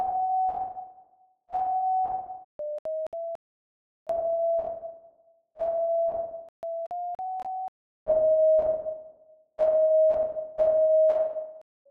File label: Sine Wave